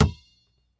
Basketball Bounce; Blastwave FX

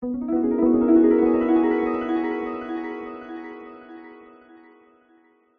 sound design magical rising twinkling nostalgic

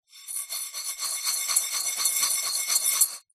rice in metal pan shake; zapsplat

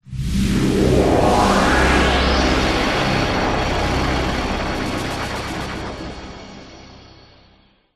magical portal open; zapsplat

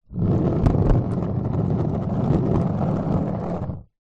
skateboard plastic short roll on street; sport

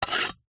household knife sharpen in sharpener

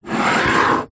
household ceramic plant pot small slide desk

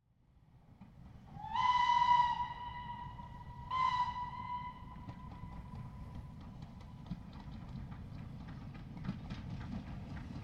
transport steam train pass close by with whistle

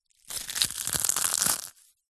egg shell crush crack; zapsplat

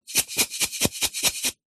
household epsom salts glass jar shake; zapsplat

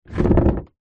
household chair plastic drag wood balcony

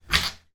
toy suction pad stick to bathtub squelch

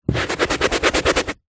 household brush scrub carpet; zapsplat

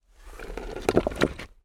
large rock lift movement beach rockpool; foley

